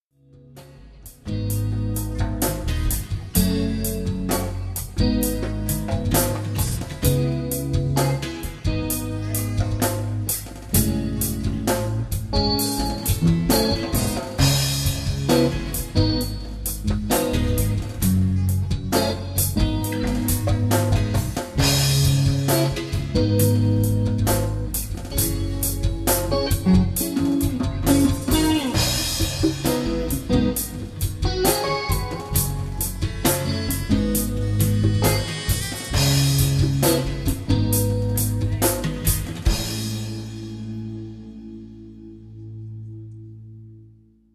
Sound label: Jazz Interlude